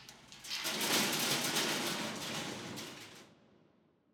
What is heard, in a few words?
ambience room torture cage